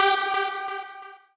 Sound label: lead1 g3